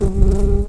Humilis workers, bumblebees

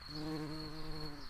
bumblebees, Pascuorum queens

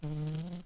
Lapidarius queens, bumblebees

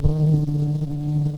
Lapidarius queens, bumblebees

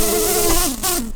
bumblebees, Pascuorum queens

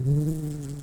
Lapidarius workers, bumblebees

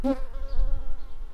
Recording, bumblebees, Sylvarum workers

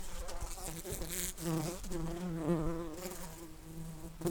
Pascuorum workers, bumblebees

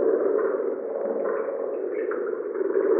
Common or Grass Frog

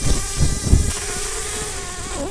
bumblebees, Pascuorum queens

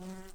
Humilis workers, bumblebees

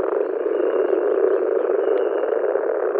Moor Frog

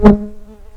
Pascuorum workers, bumblebees

